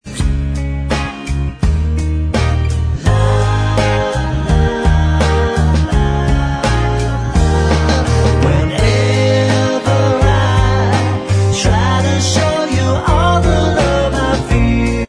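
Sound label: j pop, backing vocals track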